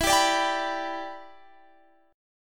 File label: E Minor 7th, Guitar, Standard; strummed